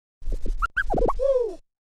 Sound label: OLDSCHOOL SCRATCH 96